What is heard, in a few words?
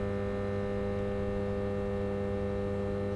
voltage loop